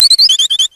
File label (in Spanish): Grito de Fletchling; wikidex